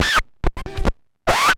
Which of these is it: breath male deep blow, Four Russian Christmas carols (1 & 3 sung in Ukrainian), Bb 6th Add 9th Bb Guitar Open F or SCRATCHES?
SCRATCHES